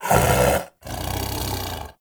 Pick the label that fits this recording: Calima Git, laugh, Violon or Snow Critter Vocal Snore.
Snow Critter Vocal Snore